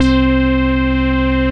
Synth Lead, Bass; Cheese Grader

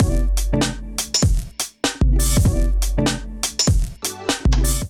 Groove 94 BPM, Drums; MIDI Clips; Allee Gate; Ableton; Chop and Swing

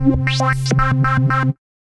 SC 30 LFO, Synth Rhythmic; Ableton; Factory Packs; Synth Essentials

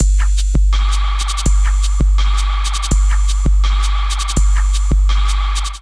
Eerie Beat 165 bpm; Ableton; Factory Packs; Skitter and Step

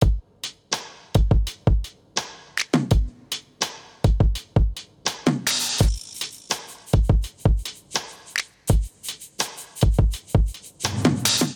Groove 83bpm, Drums; MIDI Clips; Fly Situation; Ableton; Factory Packs; Chop and Swing

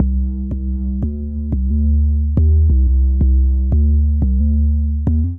Bending Boom C Minor 89 bpm, Bassline; MIDI Clips; Tonal; Ableton; Factory Packs; Chop and Swing

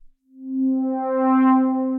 Synth Pad; Ableton; Creative Extensions; Racks; Grand Blanket

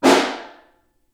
Snare Far

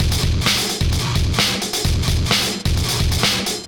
Drumkit; Ableton; Factory Packs

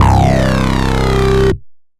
Crash Pad Bass Lead; Ableton; Punch and Tilt

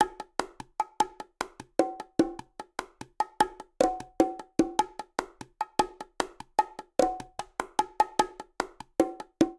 Colombia Bongo 100bpm; Latin Percussion; Ableton; Factory Packs

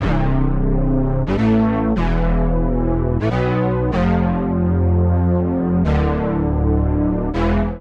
Syd Bass A Minor 123 bpm, Bassline; Tonal; MIDI Clips; Drive and Glow; Ableton; Factory Packs

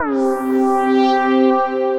Fake Bowed Guitar; Instruments; Ethereal Pads; Ableton; Mood Reel

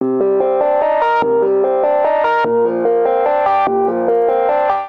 Clav Arpeggio C minor 73 bpm; MIDI Clips; Accompaniment; Rise; Ableton; Factory Packs; Chop and Swing

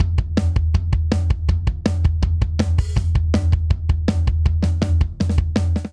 Punk Rock Buildup 162 bpm, Drum; 4 4; MIDI Clips; Ableton; Factory Packs; Drum Booth

